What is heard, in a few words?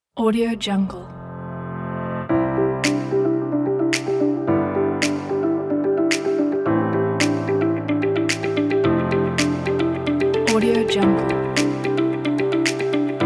ambient corporate background